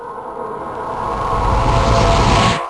meteor

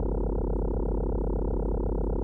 amb

